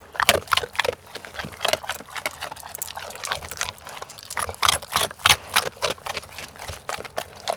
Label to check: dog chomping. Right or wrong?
Right